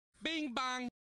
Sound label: Bing Bong